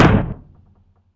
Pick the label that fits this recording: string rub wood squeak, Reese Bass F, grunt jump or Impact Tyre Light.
Impact Tyre Light